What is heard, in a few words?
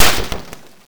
hit wood